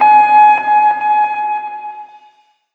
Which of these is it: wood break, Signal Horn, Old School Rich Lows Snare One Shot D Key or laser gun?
Signal Horn